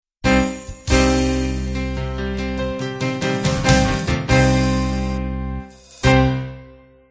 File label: piano rock end